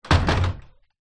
household door internal old thin wood glass panel close